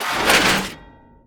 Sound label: Shield Attack Underlay